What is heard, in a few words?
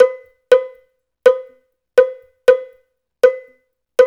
120 Triangle; Tri.Again